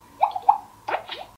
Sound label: xiaomi message ringtone; xiaomi potain message